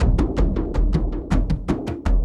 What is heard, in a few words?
Frame-Kendang Busy, drums; Sonic Foundry (Sony Creative Software) - Friendly Fire